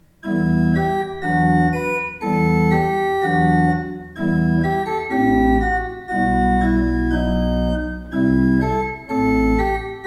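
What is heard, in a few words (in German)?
Schuke Berlin, pfeifenorgeln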